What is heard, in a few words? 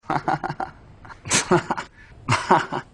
laughing; the room